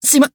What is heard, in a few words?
Taking Damage; BBCF; Hibiki Kohaku